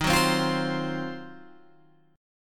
D sharp Minor 6th Add 9th D sharp Guitar Open G, chord